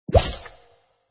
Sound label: pop